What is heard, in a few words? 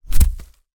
horror head fall into basket or ground after chop off; zapsplat